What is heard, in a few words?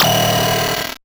Noise A, 8-bit, Bitty Noises